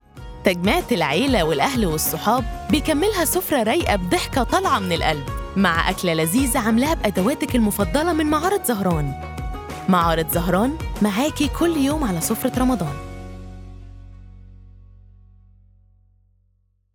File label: with music; Commercial-Zahran Stores